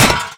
robo step, footsteps; Garry's Mod; tf2; mvm

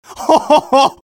laugh happy

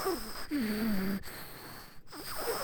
critter idle breathing loop; nightmare criters

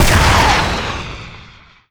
airstrike fire; tf2

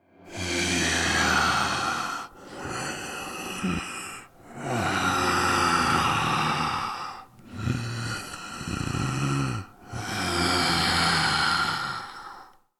breath; brainrot; italian brainrot 3; nihilanth

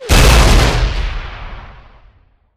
rocket explosion close; zoochosis helicopter

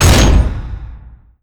tunnel door open complete; ambient; Garry's Mod; tf2; rottenburg

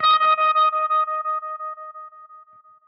class menu, music; Garry's Mod; tf2

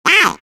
bumper car quack; Garry's Mod; tf2

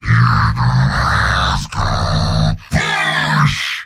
singing; heavy; mvm

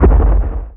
footstep; brainrot; italian brainrot 3; roshan

